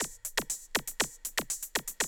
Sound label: 120, Classic Drum Loops